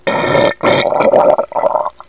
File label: burp